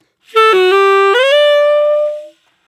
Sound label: Sax Solo C; саксофон